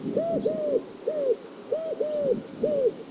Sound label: eurasain collared dove